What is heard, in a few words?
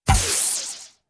Synthy Hissy Ricoch